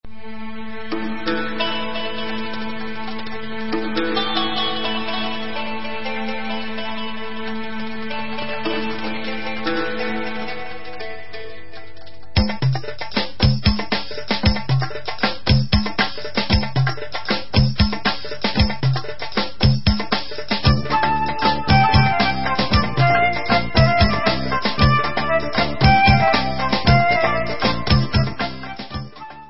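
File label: Instrumental, Israeli Dances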